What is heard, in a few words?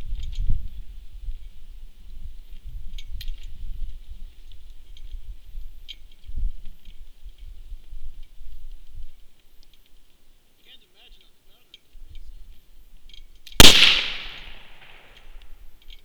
Short Rifle, Flintlock Rifle; forlorn